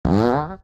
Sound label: fart